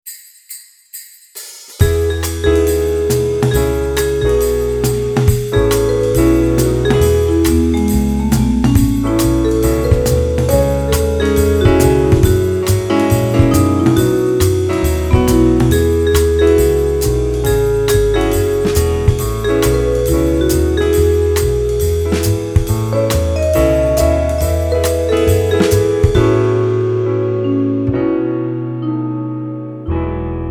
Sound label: jazzy christmas, Klingelton